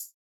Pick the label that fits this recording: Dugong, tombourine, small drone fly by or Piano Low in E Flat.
tombourine